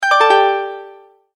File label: Multimedia Tone-Return/Back